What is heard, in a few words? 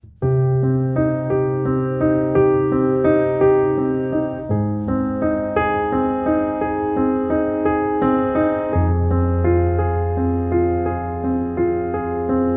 Dance Of The Dying Swan instrumental